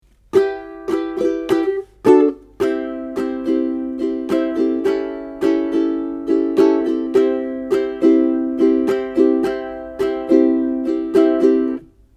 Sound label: Strum, ukulele